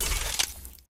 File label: reload, arms; emx pmg90